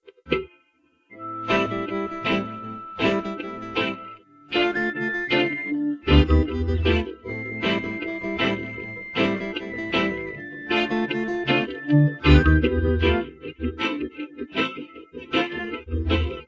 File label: guitar